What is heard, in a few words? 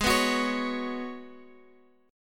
G sharp Minor 11th, Guitar Open F; strummed; x,x,8,6,9,8; G#m11